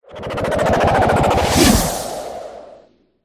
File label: snowman buff